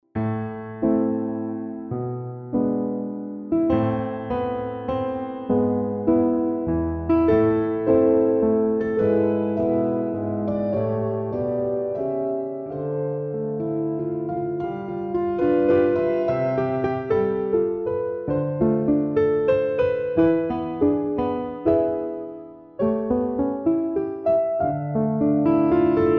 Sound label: Piano; AtomicTransient